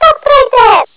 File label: fairy stop; cirnogodot